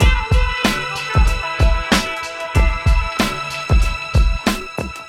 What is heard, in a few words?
Hiphop, ringtones; Google; NewWave Labs